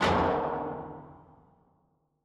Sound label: bounce sm, ladder